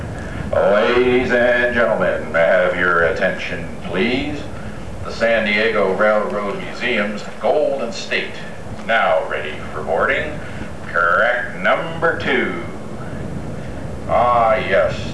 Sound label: wolf